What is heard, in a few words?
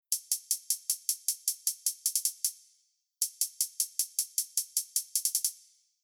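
hi-hat; EQ Comp Basic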